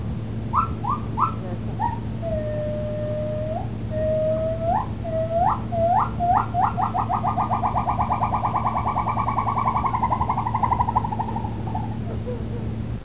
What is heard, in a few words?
Fem, gibbons